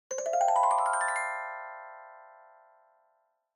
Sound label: Music Box (Dry-Long)